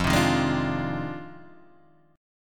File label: F Minor 13th, Guitar, Half Step Down